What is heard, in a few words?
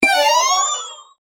the exciting sound of upg, upgrade